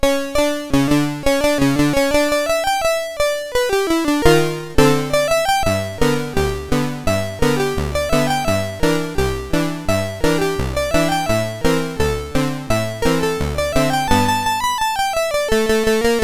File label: Jazz Pizzicato, MOS6581R2